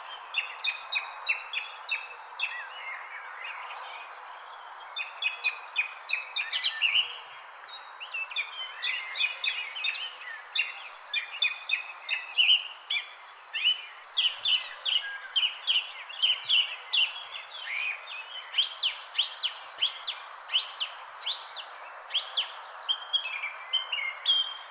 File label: birds